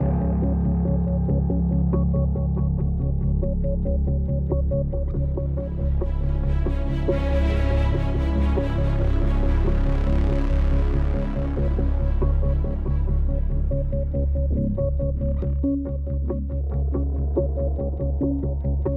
Explore Dark; Infinity Blade 3

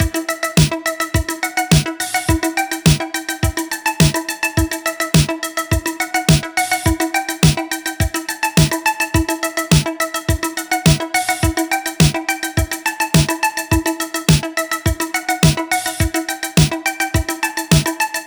Horn Path Loop 105bpm Faded Outro

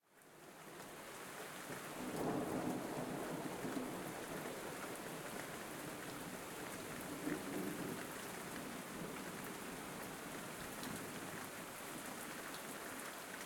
Nightmare-Rain; Super Mombo Quest

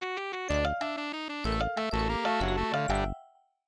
MIDI Animation stings

